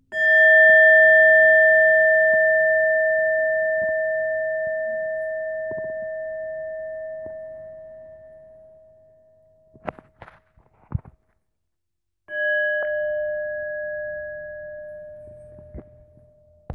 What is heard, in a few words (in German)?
Antik Klangschale